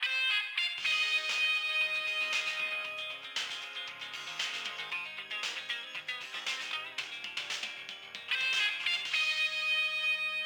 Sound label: Radio Jingle (Morning)